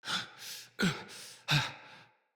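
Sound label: Cry Heavy Run, Barks; Battle; Envoy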